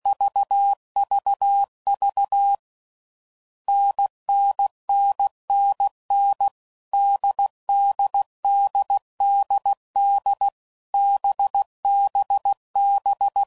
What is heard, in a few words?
CW Code Groups 5WPM, Difficult Combos; HamRadio; Morse Code; Mixed; Combinations